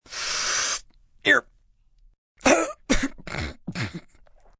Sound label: Smoking Up, Drugs, male: the sound of 'smokin' up'; VoiceBox